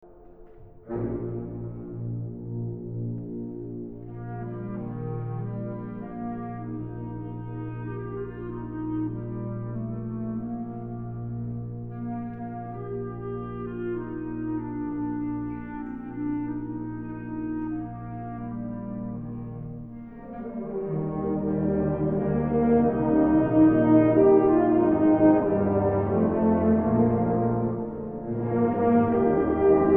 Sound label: National Anthem, Symphonic Band; Rio Rancho Symphonic Band